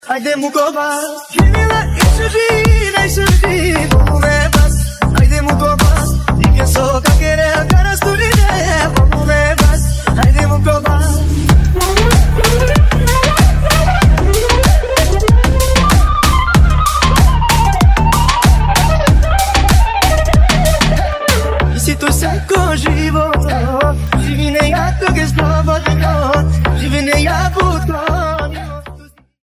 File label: Bass Bass